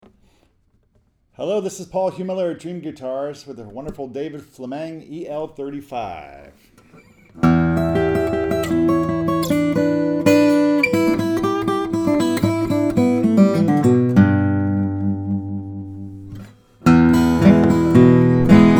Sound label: steel string guitars, flattop; flammang el35 vintage gibson l-3 l-00